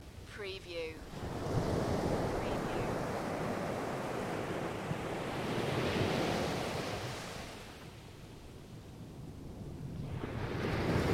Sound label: Crashing Waves Loop; ocean; beanstalk audio